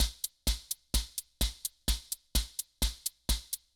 Guild MG10C-1 Loop, Drum Machines; Guild MG10C-1 Sample Pack; 300 Drum Machines

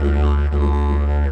Digerido; Best Service; Ethnic Journey Sound Cube

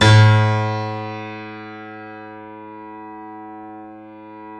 C7 FF, The Ultimate Piano Collection; East West

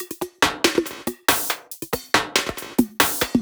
Loops 140 BPM Hihat Percussion Loops; Vengeance - Essential Clubsounds Vol.4

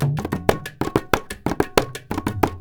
INDIA L; Spectrasonics - Heart of Asia CD2

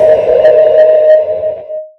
Hats, Alesis Performance-Pad

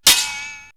Metal To Metal, perc fx; X-Static Goldmine

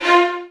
VN FX, Virtuoso Strings

